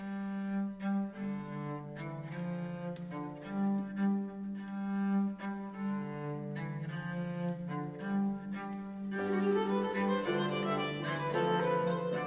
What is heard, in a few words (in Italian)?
Danze rinascimentali